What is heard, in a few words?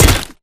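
impact bullet light ballistic android; Titanfall 2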